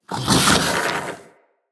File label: Vocal Alerted, Hunting Critters; Characters; Predasite